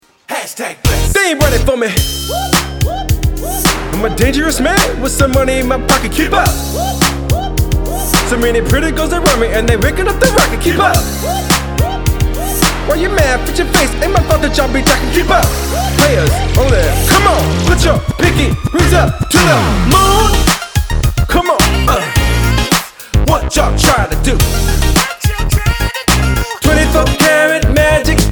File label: solo piano